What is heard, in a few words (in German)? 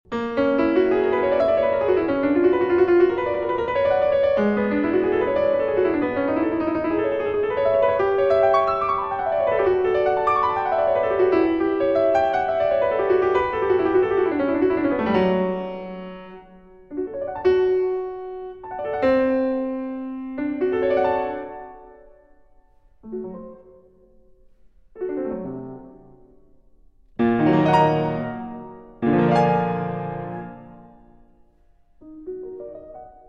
Freie Fantasie fürs Clavier in F-Sharp Minor, Allegretto